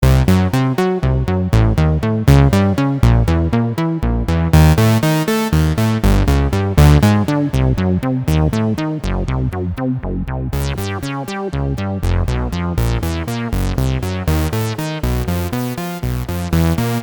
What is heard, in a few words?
arp phrase; vexations